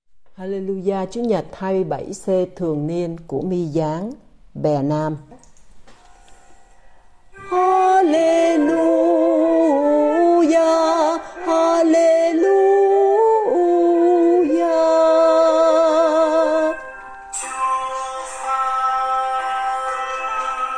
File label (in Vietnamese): Alleluja nam; ca doan teresa